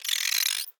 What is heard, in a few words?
Insect Vocalization